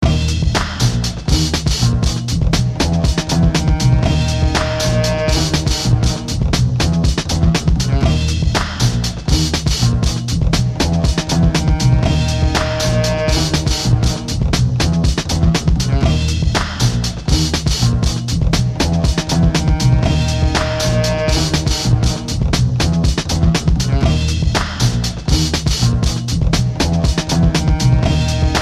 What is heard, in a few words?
120bpm, beats